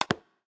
biometric id lock, phonetones; LG V60 ThinQ

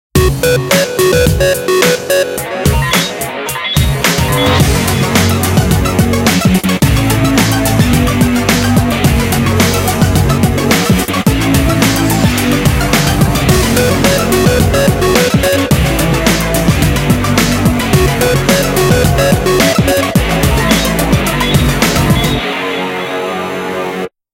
Flash Sparkle, Nokia Tones; Windows Phone apps

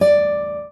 Classic Guitar

